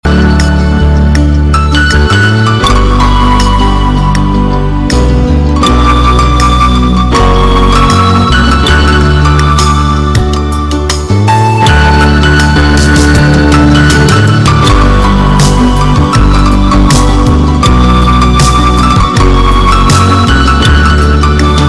Instr

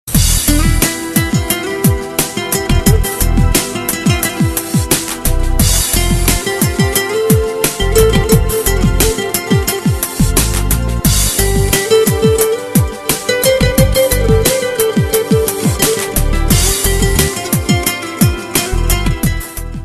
Iranian Melody

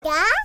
Baby Sound

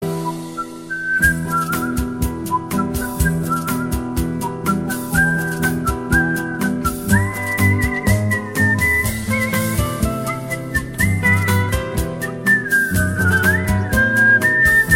Bella ciao whistle

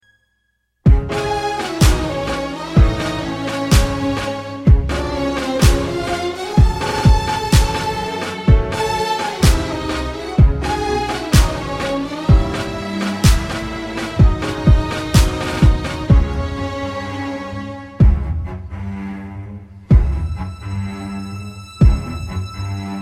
Dream Instrument

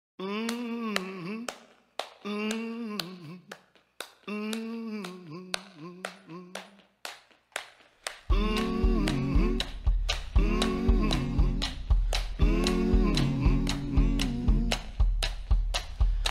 Hum Beat

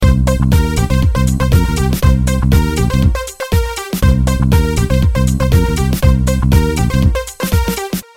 Reggae

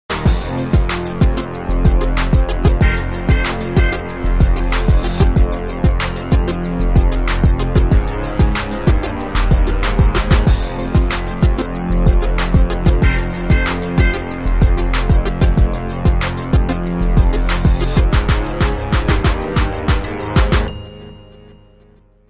Sick Beat

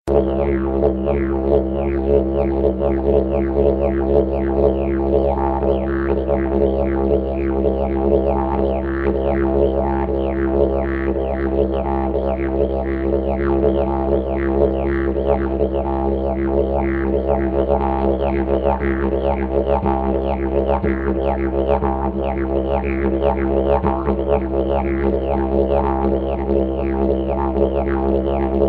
Aboriginal ringtone

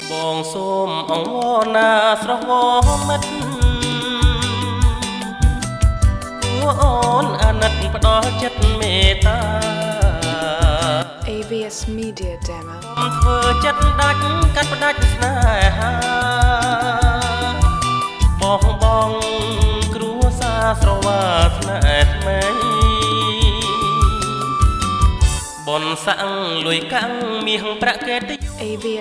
Khmer Music

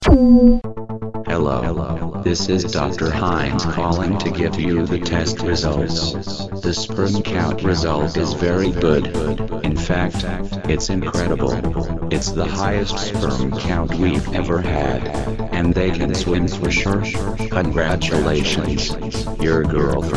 Tone; 22nd Century

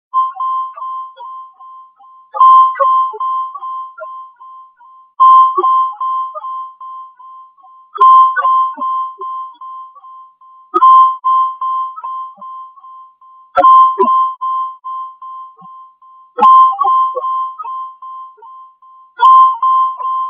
Sonar Tone

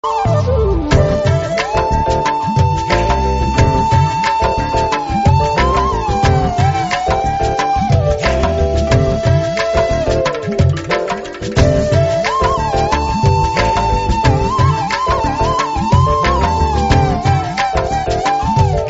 Flute Beat

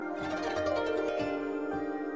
Sms Zen

